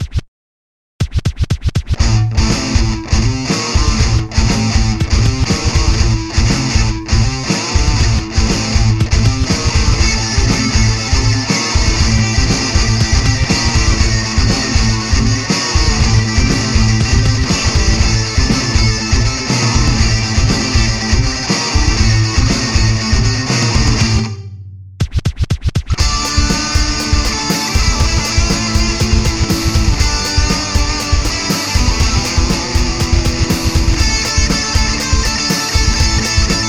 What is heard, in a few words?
beat